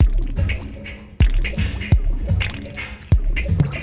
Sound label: Sparkle Kit Groove 125 bpm; MIDI Clips; Drums; Ableton; Factory Packs; Lost and Found